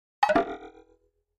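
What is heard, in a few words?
Pop Up Double Tap Spring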